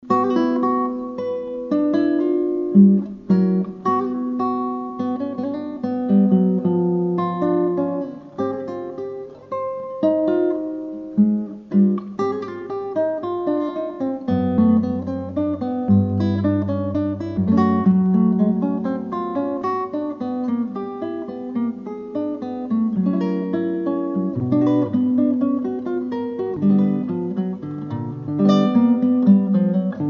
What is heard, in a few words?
Guitar